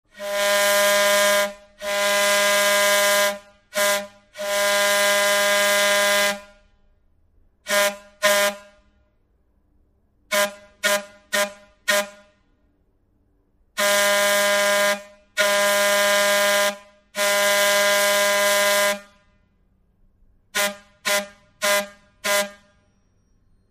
Train Air Whistle; horns

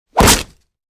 Bullet Tears Body

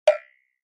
Button Hollow Tin Hit

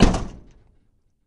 Camaro Door Close Hea, chevy camaro

